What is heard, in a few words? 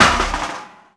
metal hit; Tony Hawk's Project 8; XBOX; bouncyobjects